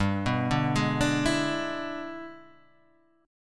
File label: E 7th G, guitar; E7/G arpeggiated; JGuitar